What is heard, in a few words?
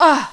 pain, male